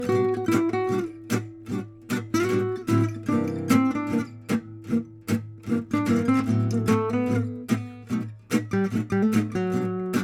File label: acoustic; nux optima air